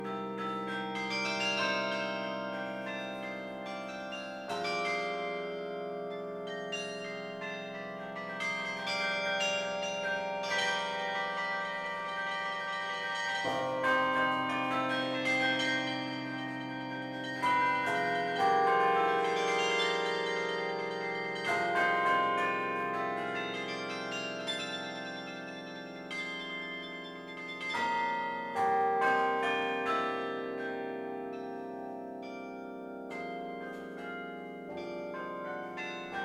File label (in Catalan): campana